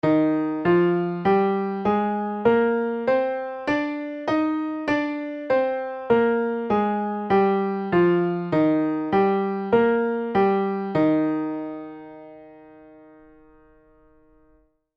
tune up Eb Major

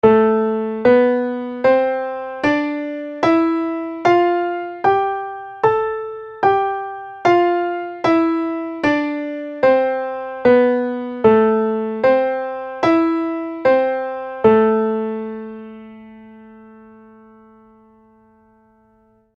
tune up a minor

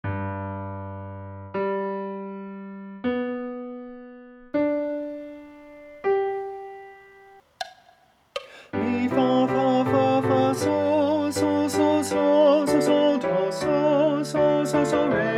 now is the month of maying tenor solfege; morley

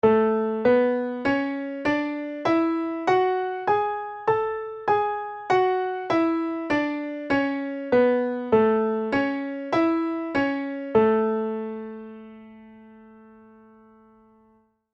tune up A Major

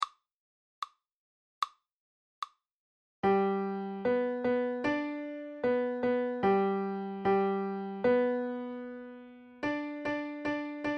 major triad; intervals

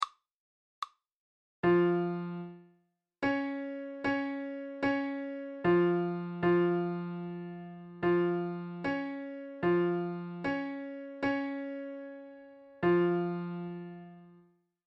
minor 3; intervals

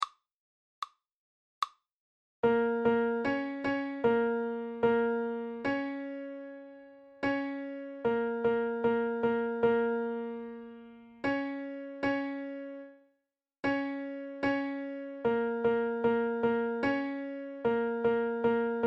minor 3; minor third; intervals minor 3rd exercise

